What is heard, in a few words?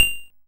Xylo F4; multi-samples; CHIPSHOP DELUXE